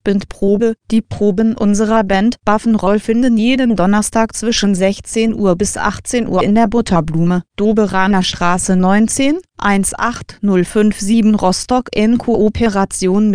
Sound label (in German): bandprobe